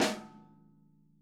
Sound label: SNARE, Interactive Drum Kits